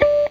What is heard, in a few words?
BLU SFT FNGR, Guitar; 90 s Sample CDs; Quantum Leap - Guitar & Bass